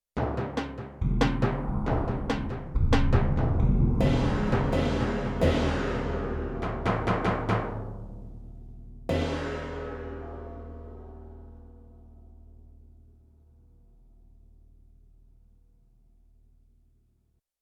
Stereo-Tone, Timpani & Gong

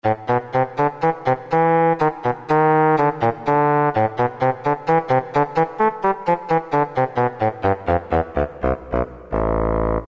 Bassoon; Roland PN-D50-01

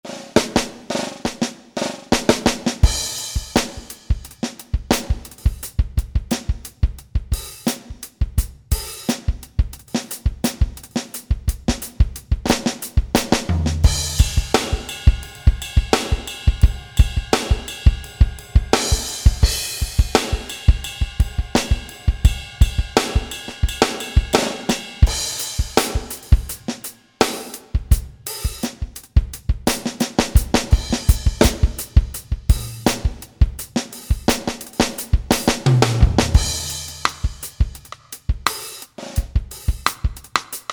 Poppin KIT, Roland SRX-01 Dynamic Drum Kits

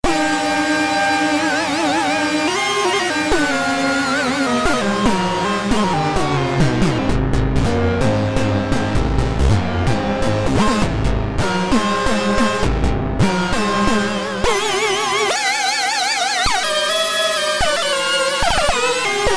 Painful Solo, Prophet-5; Sequential Circuits Prophet-5 (Ver.3); Factory Patch; File 2 Programs

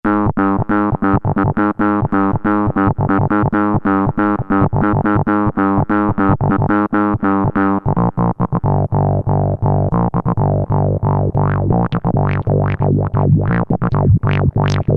Bass; Cheetah MS6

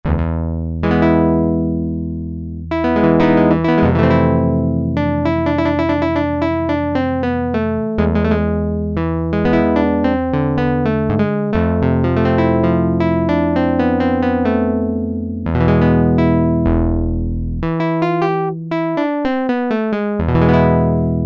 Guitar, Roland Juno-106; Factory Preset

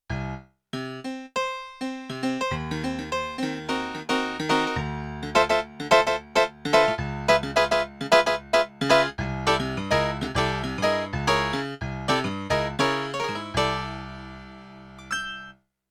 Claviano; Yamaha TX16W; Jazz Performance; Jazz Performance 2

